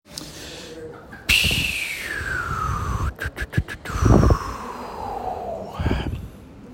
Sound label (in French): Soupir; Index des soupirs; Hadjithomas Joreige